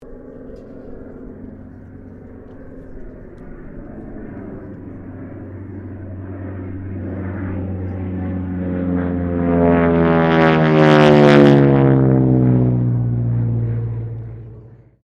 Aircraft AT-6D Harvard Mk IIB 1942 Pass, Harvard MkII training aircraft; Airplanes